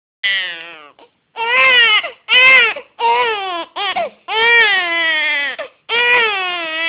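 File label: baby hungry, male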